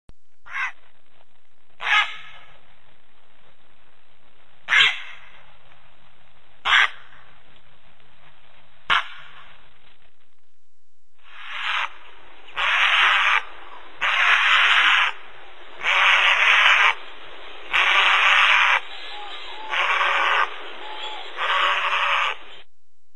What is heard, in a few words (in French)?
heron; oiseaux